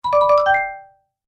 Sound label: Happy Game Bonus; Video Game Sound Effects